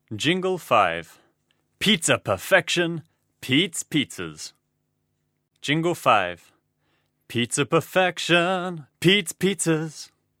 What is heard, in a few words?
Jingle; poems and songs